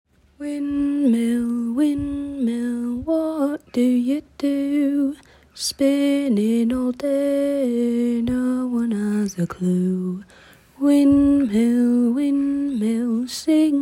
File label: windmill